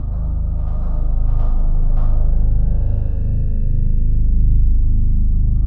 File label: dark deep atmosphere; LOOPING AMBIENCE